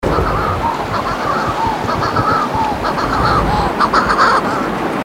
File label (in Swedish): Mindre lira